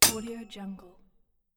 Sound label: sword hit